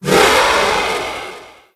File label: shield break woof heavy; Apotheorasis